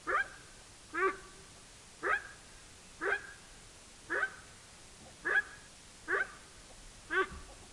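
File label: antelope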